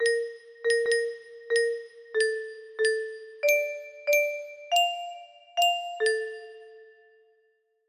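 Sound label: music box melody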